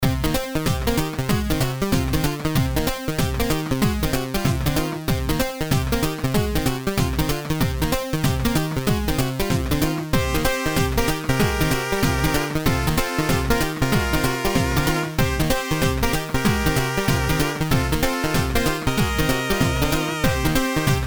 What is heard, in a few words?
Retro tic tac